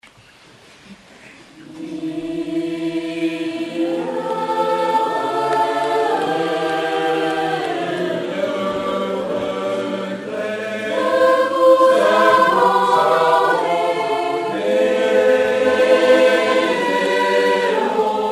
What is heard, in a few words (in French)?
josquin mille regrets concert soir; live au miroirs